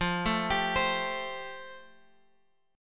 F Major Add 9th Guitar Open F; Fadd9; arpeggiated

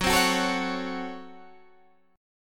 C sharp Major 7th Suspended 4th Sharp 5th F sharp

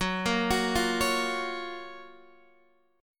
Gb Major 7th Gb down Guitar DADGAD, chord

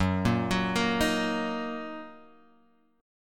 F sharp 6th, chord

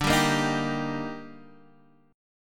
D sharp Major D, chord; D#/D strummed; JGuitar

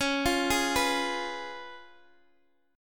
Db 7th Db down Guitar Open D

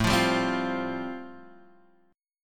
A Minor 7th Sharp 5th A, Guitar, Half Step Down

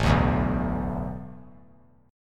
A Suspended 2nd Suspended 4th; Asus2sus4 strummed

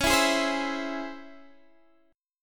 C sharp Minor Major 7th C sharp Guitar Open D

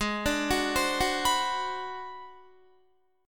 Ab 7th Sharp 9th Flat 5th Guitar, chord; Ab7#9b5 arpeggiated; JGuitar

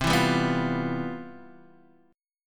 C Major 11th C; CM11 strummed; JGuitar

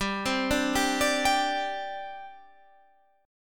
G Suspended 4th, Guitar, Standard; Gsus4 arpeggiated; JGuitar

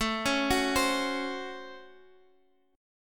C sharp Major 7th Suspended 4th Sharp 5th, A; C#M7sus4#5/A Chord {X X 7 6 7 8}; arpeggiated

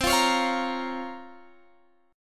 Bb Minor C, Bbm/C; Bbm/C strummed

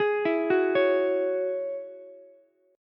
F sharp 7th Suspended 2nd, Ukulele; F#7sus2 arpeggiated

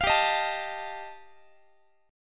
G 7th Suspended 2nd Sharp 5th G chord, Guitar; strummed; JGuitar

